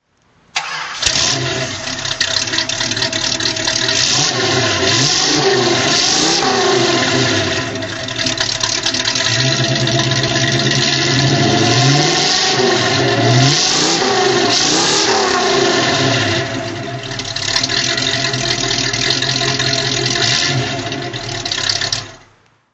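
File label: jensen cv8 throttle, engine sound; Jensen C-V8 Mk II, 1964; V3D/ ClassicarImages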